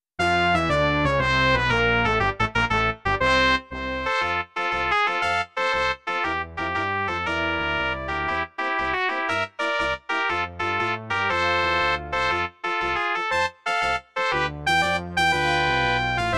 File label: March, Brass Quintet; Liberty Bell March